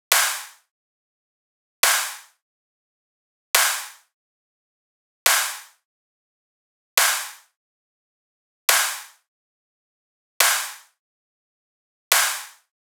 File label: Dubstep Drum Loop Snare, 150bpm; Full Drum Loops (Stem Seperated); Ghosthack; Dubstep Sound Arsenal